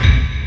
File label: LARGE BD, Industrial; BD KIT; Industrial Loops